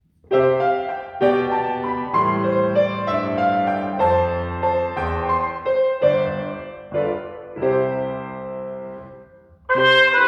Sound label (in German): Trompete und Klavier; Ostermontag; Klavier Ulrich Roth